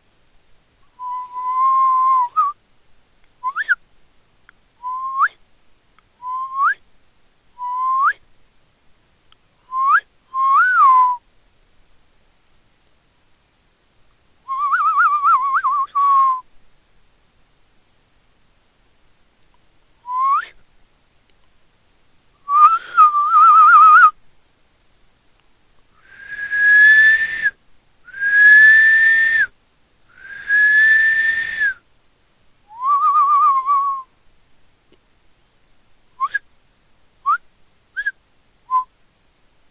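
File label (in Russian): набор свистящих звуков которые я создал хорошо посвистывая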